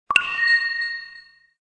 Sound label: box get ui box boom; croods